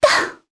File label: Vox Landing, jp; heroes; Yuria; kingsraid